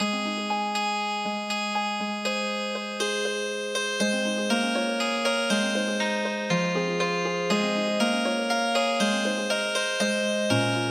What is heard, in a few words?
gae go ri ta ryeong Healing Harp